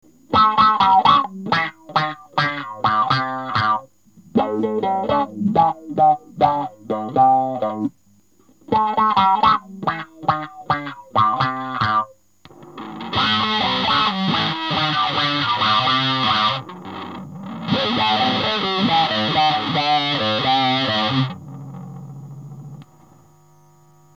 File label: MUTRON HI LO; MICROWAH